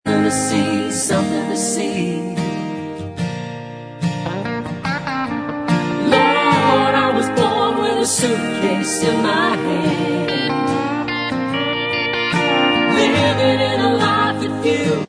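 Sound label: backing vocals, track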